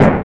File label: Vinyl Snare; Sonic Foundry (Sony Creative Software); Esoterik Beatz; Vinyl101 Kit